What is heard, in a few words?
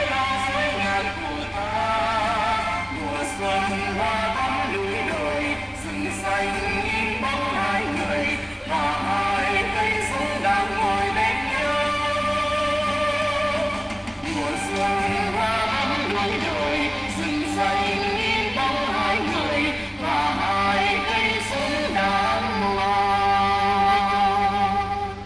music from a vietnamese sleeper train